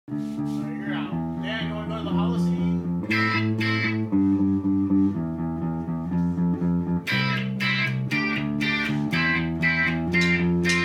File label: Ska